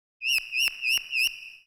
SPACE FX 140; SpaceSounds

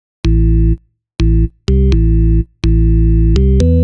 DEEPHOUSE BASS 125 A

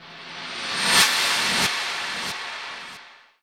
TECHNO FX 140

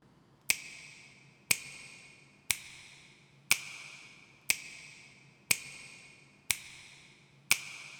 Drums finger snaps 120bpm; Touch Loops; Old Skool House - mini pack

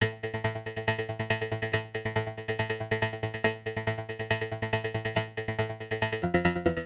TEKNO 140; Techno2

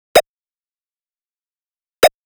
drum loop 128 Perc; Electro; drum loops; Anthemic

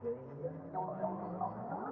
ethno, 125, A

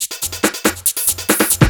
TECHNO BEATS 140

